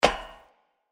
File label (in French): Footstep passerelle metalique; Blind Survivor